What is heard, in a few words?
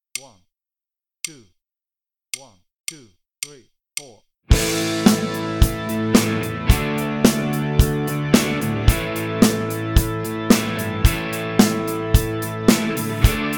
open string rock play along